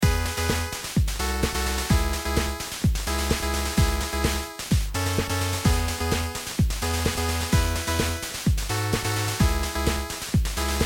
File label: beat